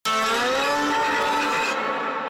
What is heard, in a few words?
slide